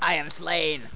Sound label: scream